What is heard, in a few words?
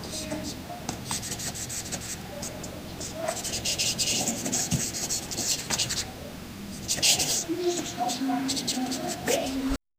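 classroom ambience teacher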